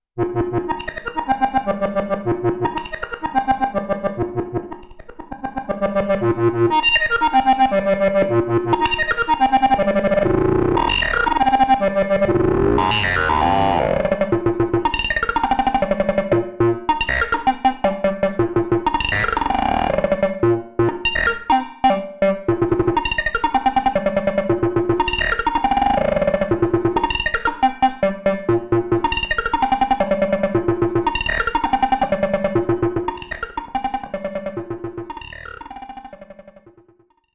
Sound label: Modulated Functions